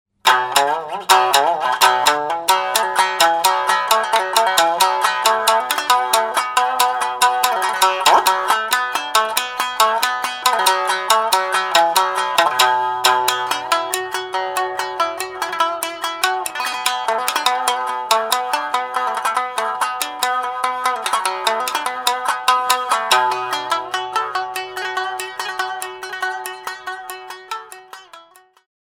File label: shamisen solo